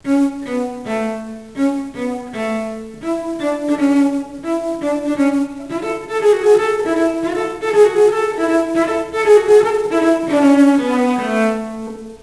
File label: cello